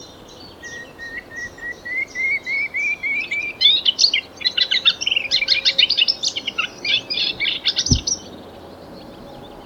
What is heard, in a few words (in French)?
lusciniole a moustaches; chant de la lusciniole à moustaches